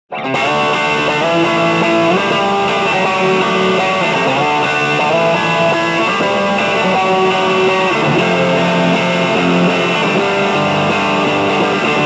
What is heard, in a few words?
Crush Dirty Delay FX In FX Loop